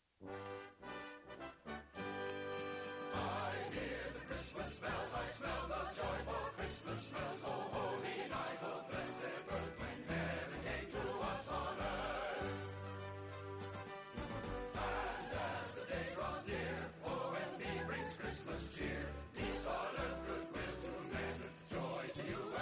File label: XMAS JINGLE